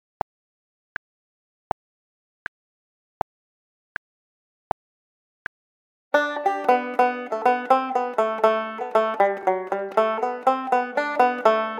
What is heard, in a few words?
jig